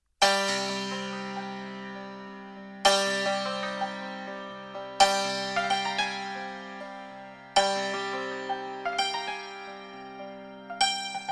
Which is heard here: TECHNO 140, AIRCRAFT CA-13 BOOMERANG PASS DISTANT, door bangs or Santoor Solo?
Santoor Solo